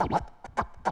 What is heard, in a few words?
Scratch